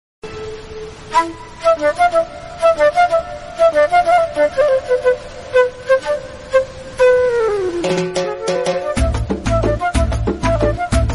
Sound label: flute ringtone heart touching flute ringtone; movie flute ringtone; thank you